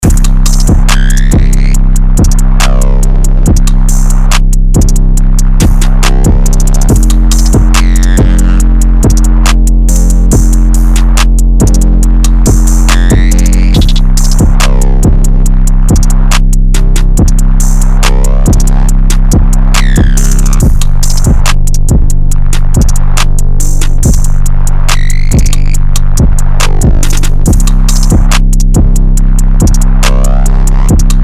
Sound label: literally just an electrax arp